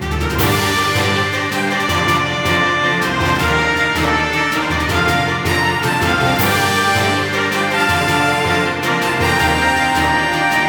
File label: battle; tukito; srpg